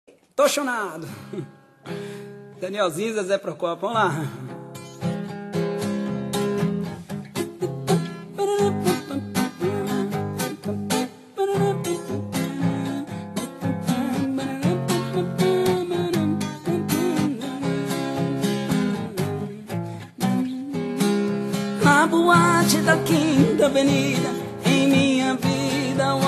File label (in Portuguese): sertanejo romantico